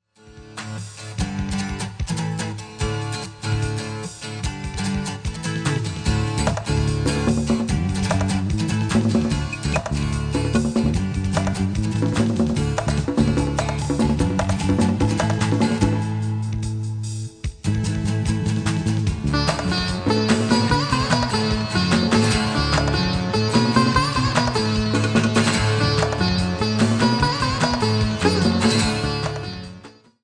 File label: raga rock; fusion of rock and Indian music